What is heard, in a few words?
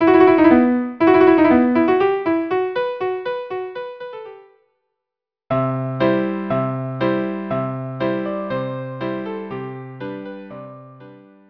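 viola duo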